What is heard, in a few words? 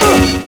ORGANUS; Univers Sons